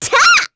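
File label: Vox Jump, Korean; Pansirone; kingsraid; voices heroes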